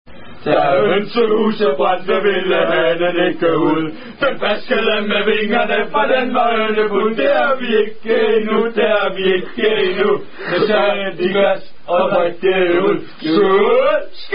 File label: Danish Drinking Songs